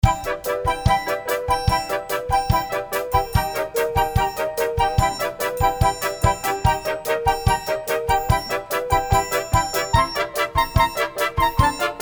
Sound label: wedding bells